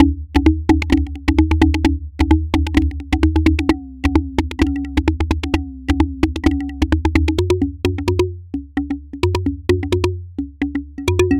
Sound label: Percussion loops; Tassman4